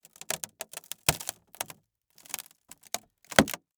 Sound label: wood panel crackle